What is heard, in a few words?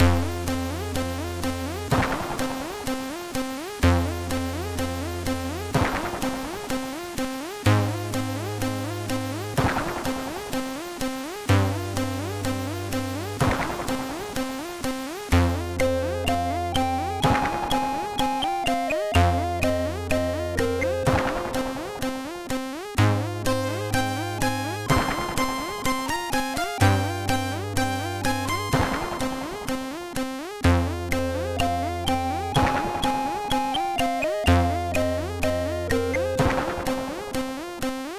Oriental Music, sid MOS6581R3